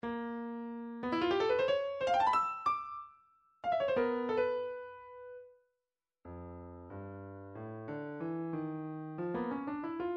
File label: ten short piano pieces, midi; shostakovich